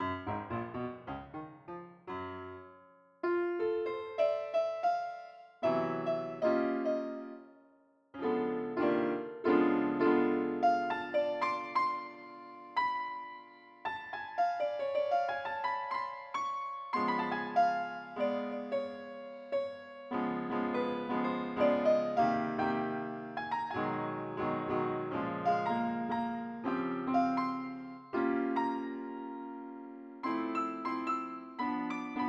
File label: piano